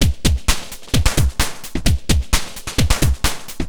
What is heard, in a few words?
Beat, Industrial